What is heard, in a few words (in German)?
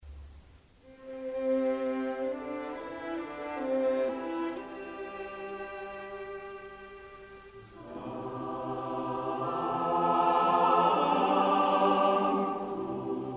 Sanktus, Kirchenchor